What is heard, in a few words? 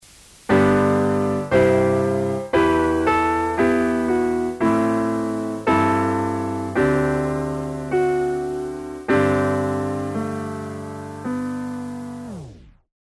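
NY Steinway D Classic LoFi; UJAM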